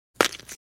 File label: Bone Break; Fights Bodyfalls